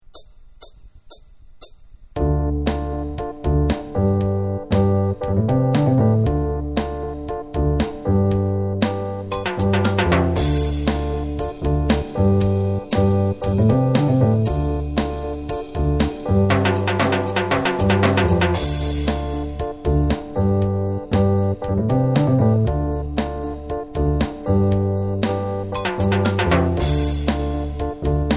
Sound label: dorian demo backing track